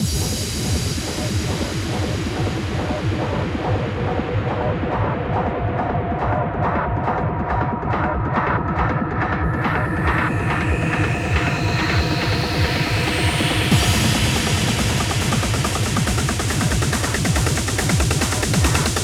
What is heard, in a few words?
140BPM 16Bars, HandsUp & Trance Fills; Timesquare; Vengeance Samples